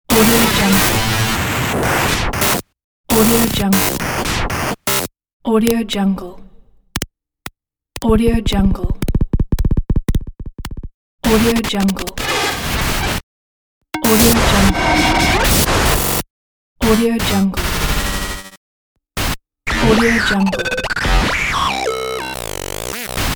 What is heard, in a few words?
the glitch pack